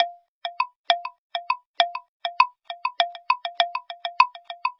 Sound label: Driftwood Marimba, Acoustic Beats; Sonic Foundry (Sony Creative Software); Myths of Technology